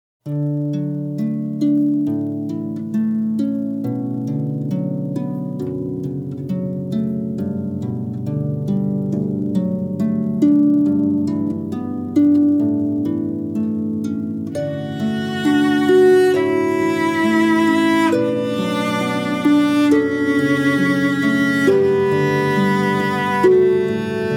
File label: Canon D